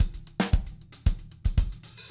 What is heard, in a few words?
SAMBA